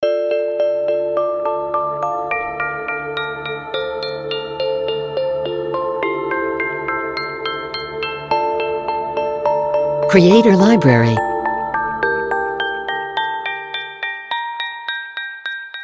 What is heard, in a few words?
Dream Pixie Loop; Ambient